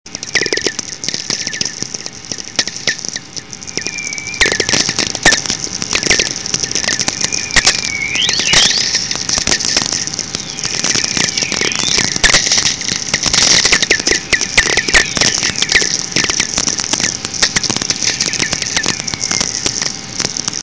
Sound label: Riser